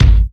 dope kick sample g key

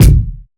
acoustic bass drum sound f sharp key

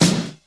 loud steel snare drum sample f sharp key

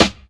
noisy acoustic snare sample c key

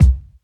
phat kick sample C key

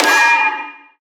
trap steel snare drum sound g sharp key

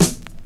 snare drum f sharp key; Mono

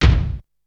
crispy kick drum sample c sharp key; kick drum samples

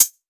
raw closed hi-hat sample a sharp key

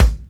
rich top end kick drum a key; Duration 0:01; Channels Mono; kick drum samples

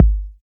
good kick one shot g sharp key

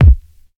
wet steel kick drum b key

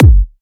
smooth kick single shot g key

